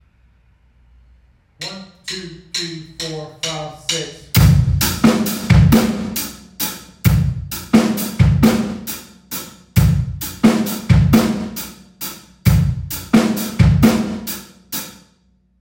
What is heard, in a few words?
6 8 beat, drums